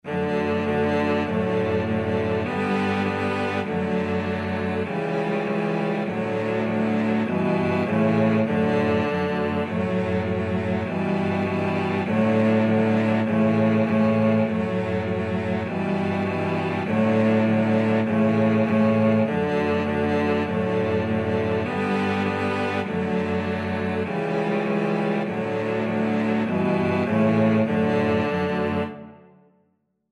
cello quartet

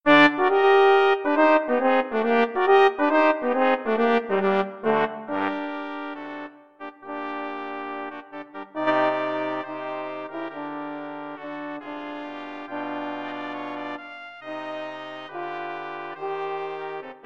Piano Concerto BRASS,brass quartet; Schumann

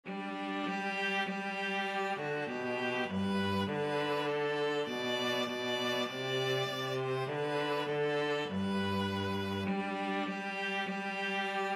viola cello duet; hark the herald

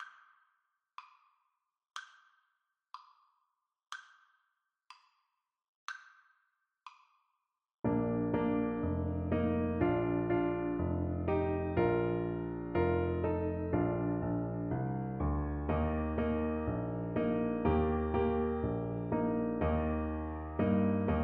do lord, Tuba

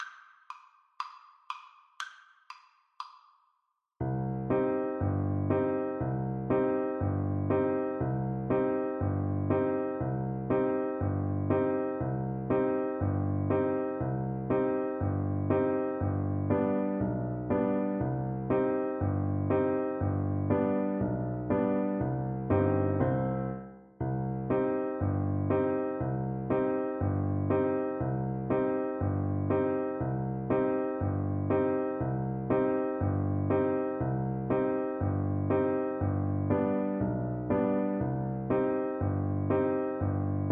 odessa bulgarish, Saxophone

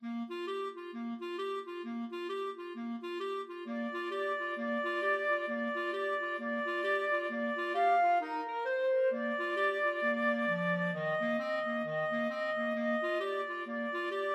jingle bells boogie flute clarinet duet

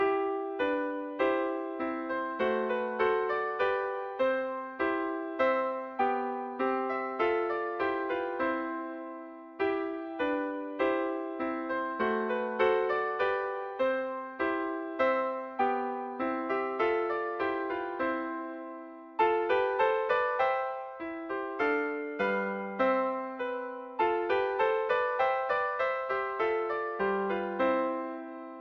violin trio

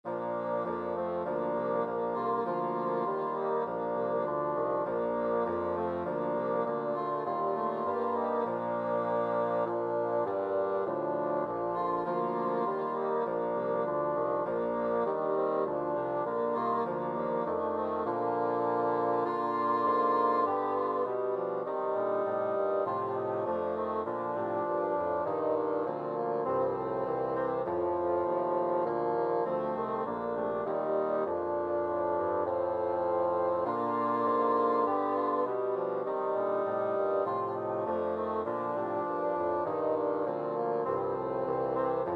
bassoon quartet

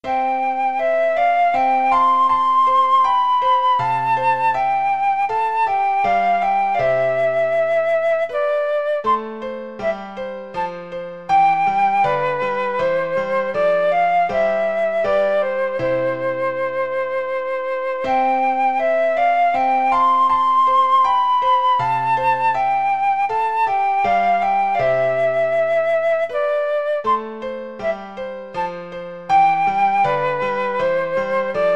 dance, flute

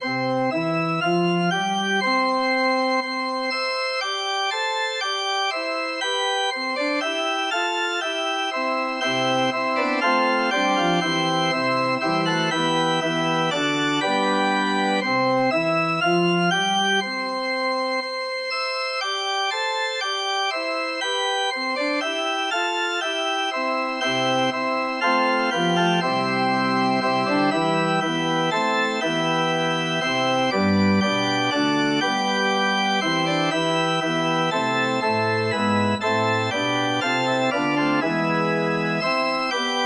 look at the sky, organ

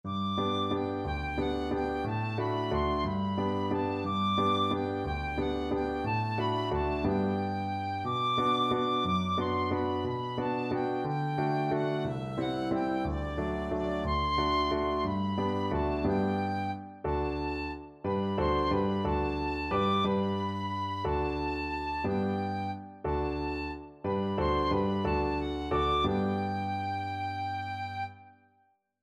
bring a torch, tin whistle

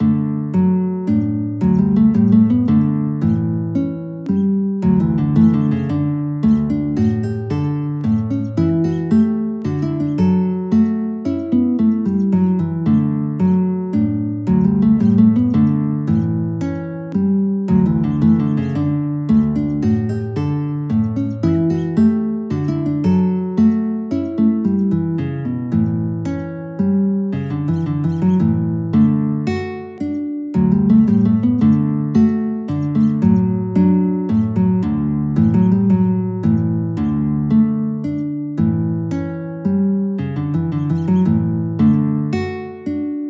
Minuet guitar; Giardinis

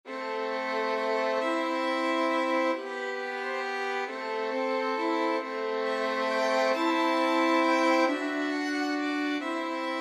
violin trio; scarborough fair

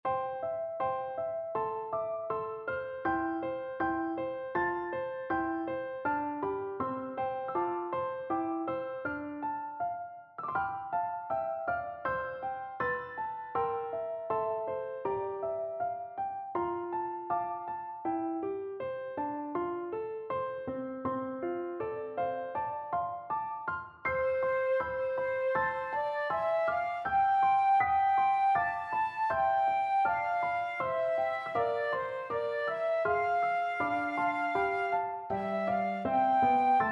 reynardine, flute

